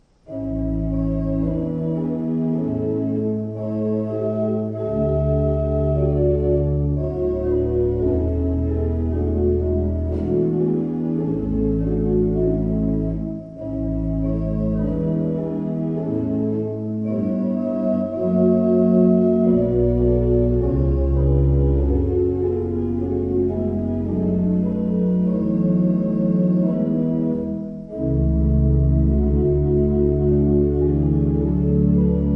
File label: Simon